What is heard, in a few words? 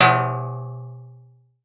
Metal Hit; 1001 Sound Effects